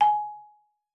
marimba G#5; Xsample VOL-4

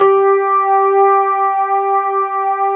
Fender G3; USB Soundscan vol.09; AKAI; Keyboards Old School

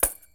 Tamborine, World Percussion One Shots; Sony CS

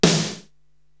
SD Atomic, Korg TR-Rack drum machine

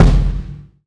Acoustic Drums - Kick; Antidote Advent

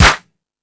House Machine, E-MU Pro-Cussion

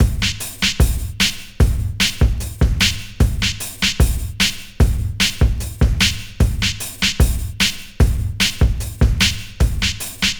HIPHOP; INIS; Funky Xtreams

